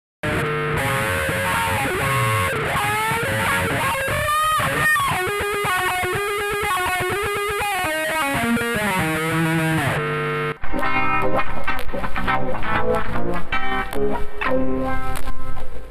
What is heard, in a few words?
WAHWAH, Dunlop cry baby